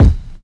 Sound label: original bass drum sample d sharp key